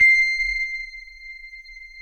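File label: FM electric piano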